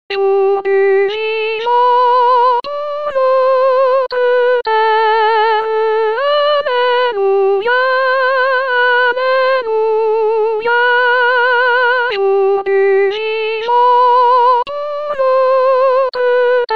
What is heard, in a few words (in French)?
Jour du Vivant Soprano